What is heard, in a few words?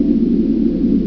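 ambient cave; ABUSE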